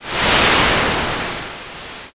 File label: wind